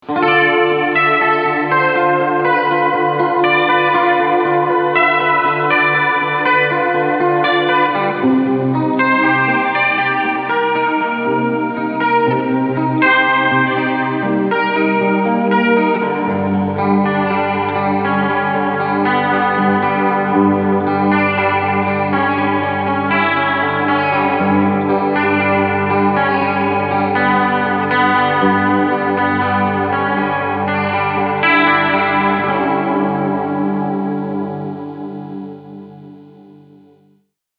JHS Space Commander Chorus Hall

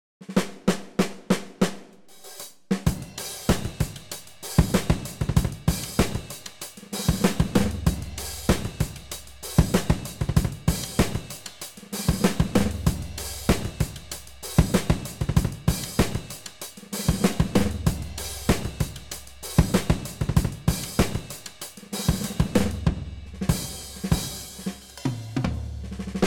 60s Late Full Funk

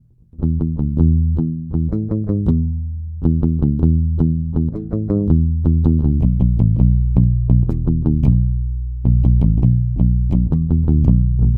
Bridge Pick

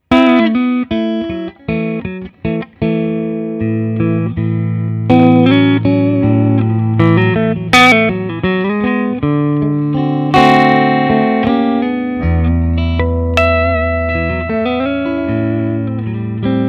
music man 112 rd

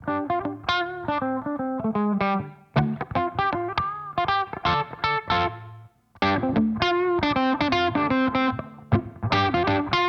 Channel A Boost

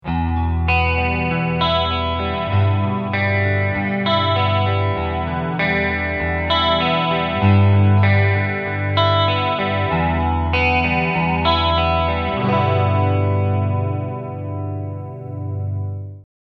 Clean Picking

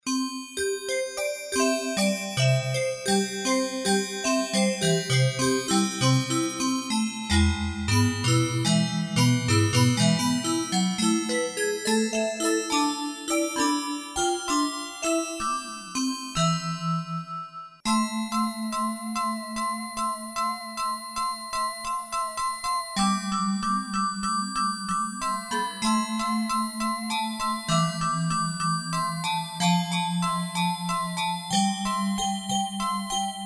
Cross Glock

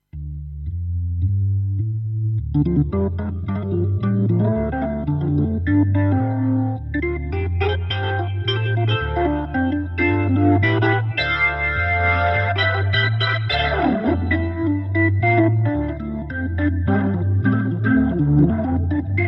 Organ; EHX Lester K

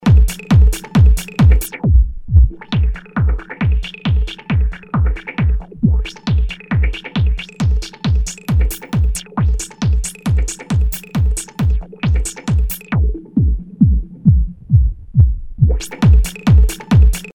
WAH

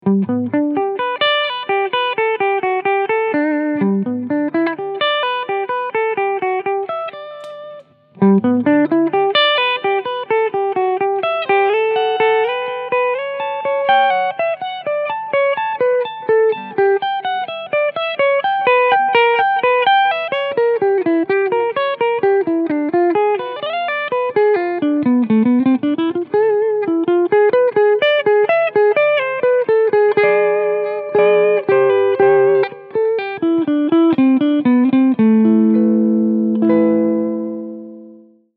Clean Sound; Source Audio ZIO E-Plex

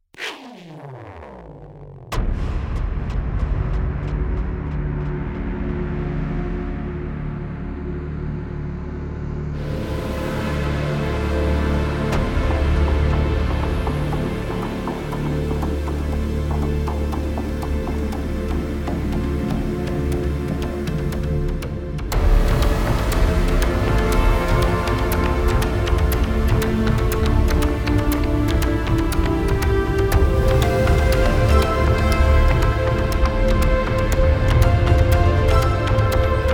Nonlinear Labs C15 test

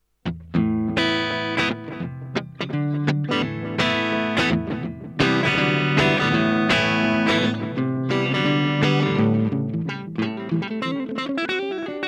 Blackstar LT Echo 15

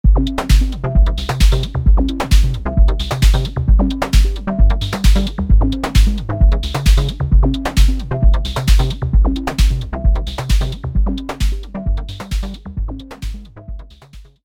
tsunami synths

